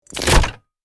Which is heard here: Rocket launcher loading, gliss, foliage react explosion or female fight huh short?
Rocket launcher loading